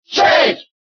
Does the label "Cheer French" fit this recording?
Yes